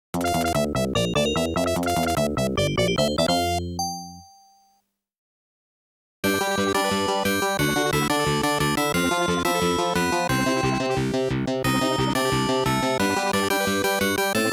Game Start ~ AFTERNOON BGM ~ Stage Clear ~ Next Stage; PLUMP POP